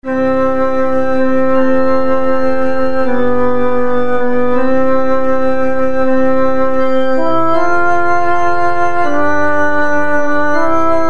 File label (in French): Kyrie Messe du peuple de Dieu, Alto, midi; Messe du Peuple de Dieu - à quatre voix